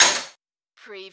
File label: Button Sound - Metal Tool Drop